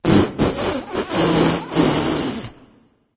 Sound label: tongue, voice; Smite; ymir skin9